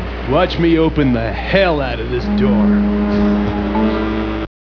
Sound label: door; MST3K